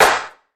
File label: subtle reverb snare drum sample A key